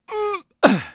EFFORT; VOICE; PHANTOM; Pulse Racer

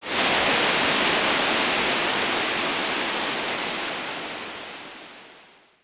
geyser; Soultree

